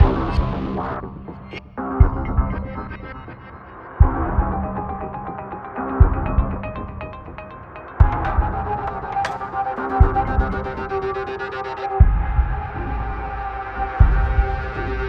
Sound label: action trailer with electronic guitars